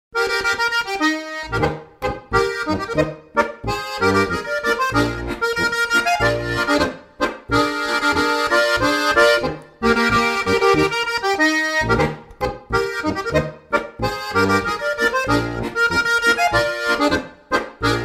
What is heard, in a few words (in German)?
boarischer